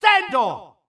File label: yells